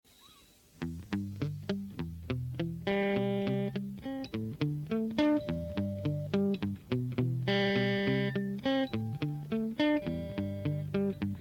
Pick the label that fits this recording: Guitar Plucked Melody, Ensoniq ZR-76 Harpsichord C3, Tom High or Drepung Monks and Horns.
Guitar Plucked Melody